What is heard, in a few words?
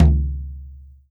drum, WORLD DRUMS; World Instruments 2; PS MIXED DRUMS